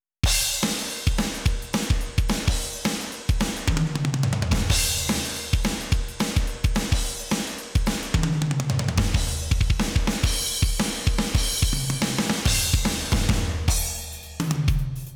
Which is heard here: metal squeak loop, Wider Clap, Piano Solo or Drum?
Drum